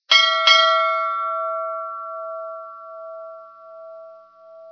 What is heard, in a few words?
ship bell; ambience; cstrike